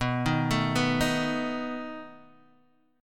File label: B 7th B down Guitar Open D